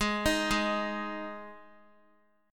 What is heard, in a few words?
Ab 5th down Guitar; arpeggiated; JGuitar